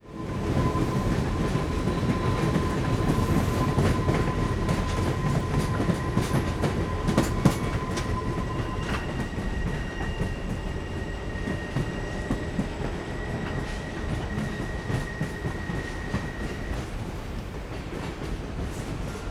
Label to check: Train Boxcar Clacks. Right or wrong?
Right